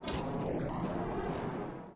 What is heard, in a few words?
frozen planet; ambient; Stellaris